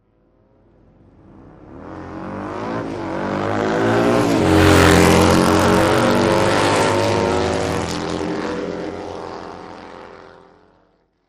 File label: Motorcycle Road Racing